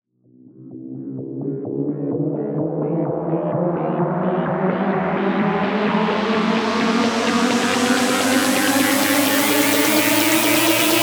Riser - 128 BPM B; House; FX; Risers; Substance Riser

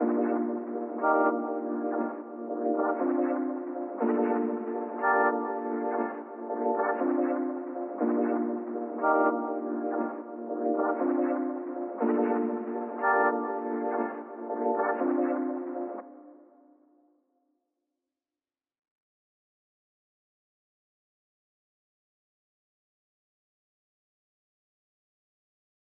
120 BPM G Min Pad; Cymatics; Blue Eyes

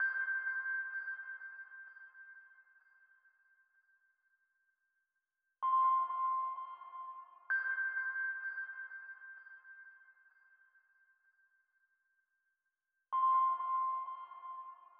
Dark Melody Loop 128 BPM C Min Pluck; Loop Stems; Cymatics; 2020 Melody Collection

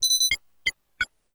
Computer Beeps; Home & Office

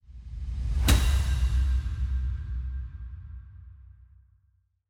Impact; Cymatics; GHOST (Beta Pack)

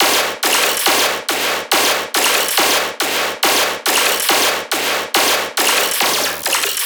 Bass Loop D# 140BPM, Dubstep

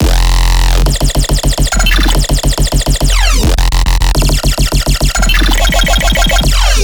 Riddim Bass Loop F 140; Overload; Ghosthack; Riddim Essentials Volume 2

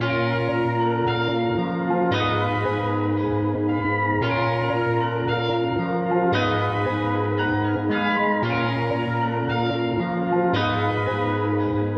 114 BPM D# Min

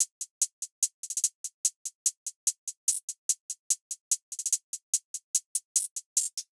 Hihat Loop - 146 BPM; Position; Cymatics; ANALOG EVOLUTION Beta; Hihat Loops

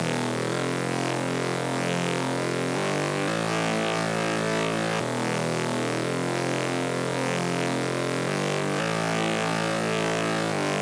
174 BPM A Min Bass, DnB; Melodies; Loop Stems; Axis

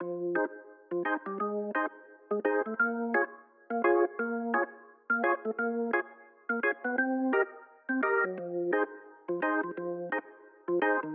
Classic Melody Loop - 86 BPM F Min Organ; Cymatics; Oracle Sample Pack

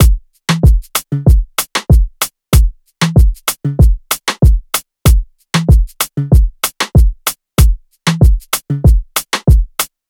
Drum Loop 95 BPM, Afrobeats; Drum Loops; Cruise; Cymatics; GHOST (Beta Pack)

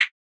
Halftime Hihat, Closed; trap; Hihats; Cymatics; Trap Starter Pack

